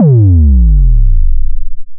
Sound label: kick